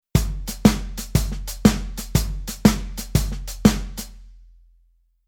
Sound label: Rhythm Shuffle